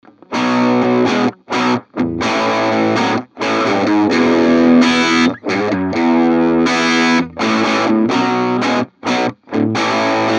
dirty; WGS G8A

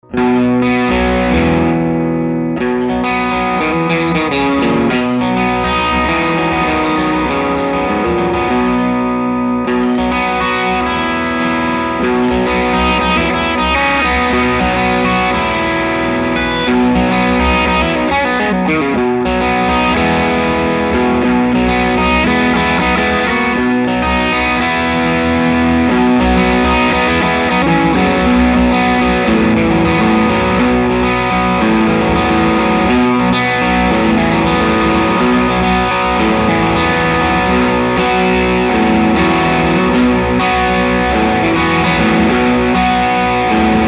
G12Q drive

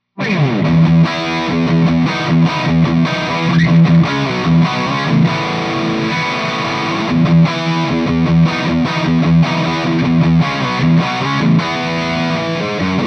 rockerverb 50 dirty

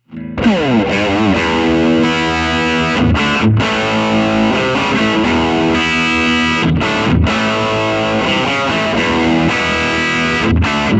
ET10 Dirty; Ceriatone; PRS

